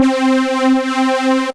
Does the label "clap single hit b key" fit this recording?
No